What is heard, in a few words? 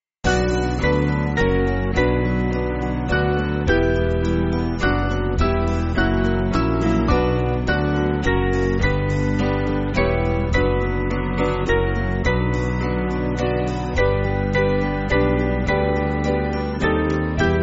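Band, Music Backing; And can it be that I should gain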